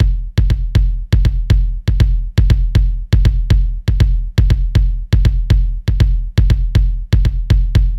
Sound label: drama 120 drum